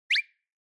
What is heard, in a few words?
Anime Motion High